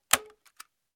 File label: single shot of staple gun; sound ideas